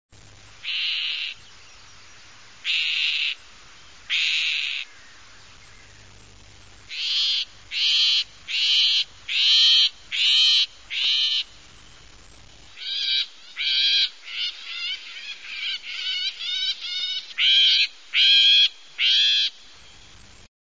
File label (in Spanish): canto alcaudon real; aves campo